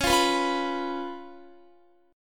Db 7th Db down Guitar; Db7 strummed; tuning=4F,4A,5C,5G,5C,6E

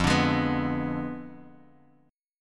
F Diminished 7th F down Guitar DADGAD

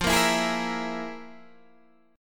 F Minor Major 7th Double Flat 5th

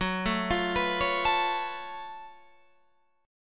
F sharp Minor Major 11th, F sharp, Guitar, Open F; arpeggiated; JGuitar

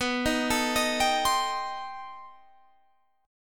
B 7th Sharp 5th Flat 9th, guitar

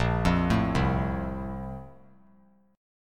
Bb Major 7th Suspended 2nd; BbM7sus2 arpeggiated; JGuitar

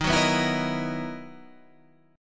B Major 11th Eb, guitar

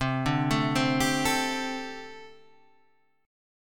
G Suspended 2nd Suspended 4th

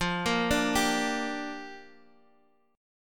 G Minor F, chord; Gm/F; arpeggiated; JGuitar

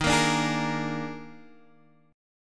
D sharp Major 7th Suspended 4th, Guitar, Standard; strummed; x,6,8,7,9,6; JGuitar

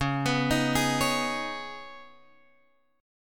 Db 7th Suspended 2nd, Guitar, Drop D

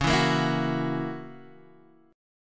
F sharp 7th, guitar

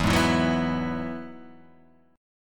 E Major Add 9th, E, Guitar, Half Step Down

